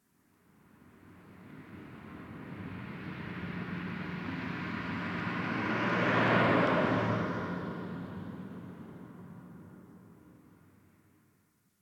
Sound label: Distant Traffic; Ambience; Warframe; NineteenNinetyNine